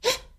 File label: peep gasp